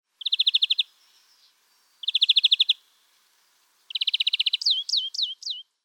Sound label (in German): Gruenfink Carduelis chloris; Vogelstimmen